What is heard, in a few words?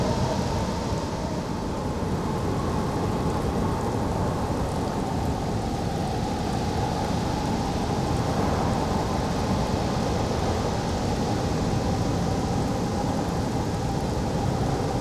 ambience arctic beach day windy drizzle; Rust